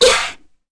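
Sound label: Vox Attack, Korean; Kara; kingsraid; voices heroes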